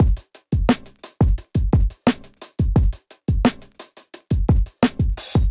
MPE Kit, Golden Era Hip-Hop Drums; MPE Kits; MPE Explination Kit; Ableton; Factory Packs; Golden Era Hip-Hop Drums by Sound Oracle

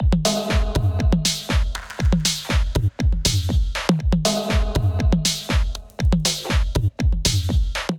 Dubfloor Kit, Drums; Ableton; Punch and Tilt

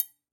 Triangle A Mute; Latin Percussion; Drums; Drum Hits; Bell; Ableton; Factory Packs

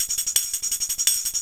D&B Tambourine loop; Dance DJ; Percussion

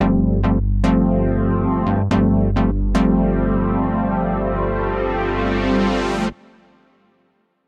Brass (C) 114bpm; Music Loops; Prophessor Cinco Brass; Ableton; Factory Packs; Singularities

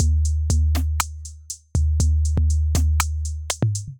Kit, Drums Synthesized; TraPERATOR Kit; Ableton; Factory Packs; Drum Essentials

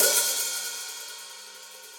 Hihat Open Steely Tight; Drum Hits; Standard Hits; Ableton; Factory Packs; Session Drums Studio

